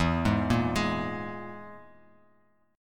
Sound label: E Major Flat 5th E, Bass Standard; arpeggiated